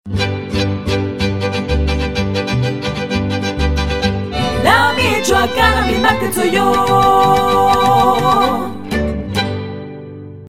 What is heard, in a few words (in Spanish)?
JINGLE CORTO